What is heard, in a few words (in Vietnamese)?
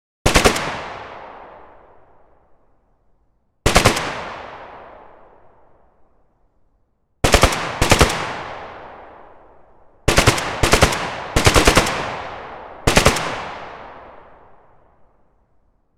Tieng sung ban vang doi